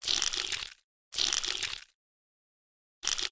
guardian beetle; monster

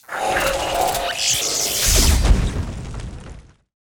death, monster; elimental; lake

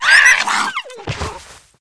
two headed hound dead

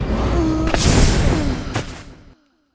bee death, monster

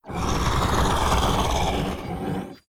Mech horn main, monster; steamer crazy

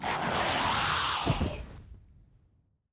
cerberos death, monster

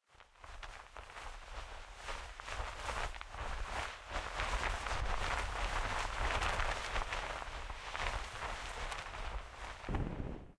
ferry, lake

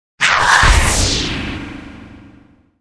bloody bat attack, monster

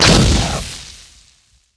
skill explo

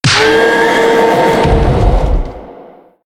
boss death; DeathKnight; monster

